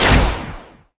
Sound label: Crossbow Poision Cast; bullet